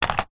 leisure toy car electric race track car down on track